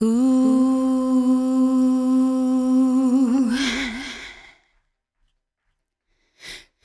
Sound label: ooh, Synths; Notes